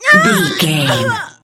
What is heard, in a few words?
voice female death